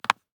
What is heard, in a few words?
DICE on Cardboard, Drop, Standard, 1 One Die; Samples; ArticulatedSounds; DICE Sfx Party (Extended)